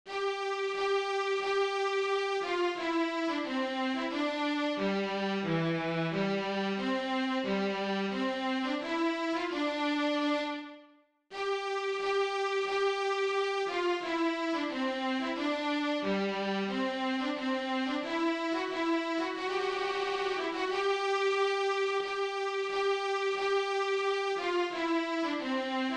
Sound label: Trumpet